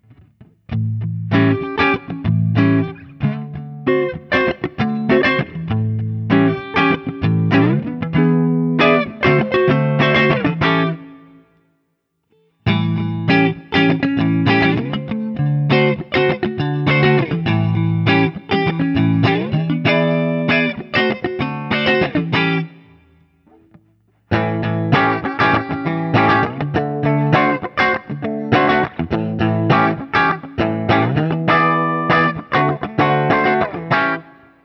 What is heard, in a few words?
Guild X175 ODS100 7th; Guild Newark St X175 Manhattan Special